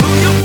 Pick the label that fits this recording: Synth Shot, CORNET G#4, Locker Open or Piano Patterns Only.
Synth Shot